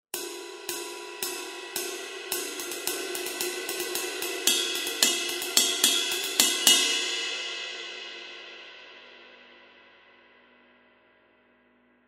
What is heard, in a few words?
22 inch hhx legacy ride cymbal; sabian; 12233XLN